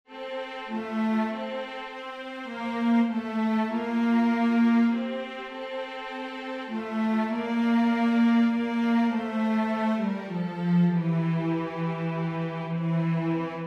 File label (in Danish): tenor; julen har bragt